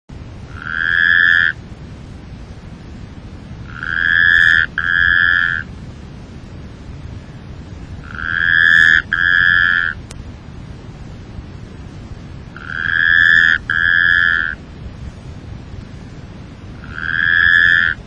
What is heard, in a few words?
Rhacophorus taipeianus